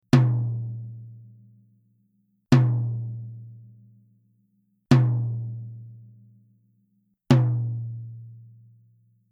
TOM Medium; Onyx

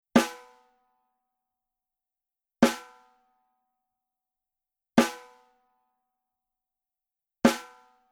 SNARE Dry Center Hard

